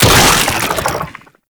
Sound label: Infested Fishing Fish Kill; Warframe; Infested Fishing Spear